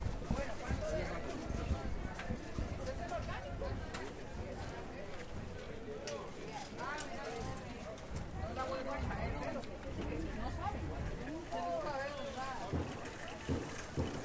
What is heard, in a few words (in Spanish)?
Caminata en Feria de Plaza Viquez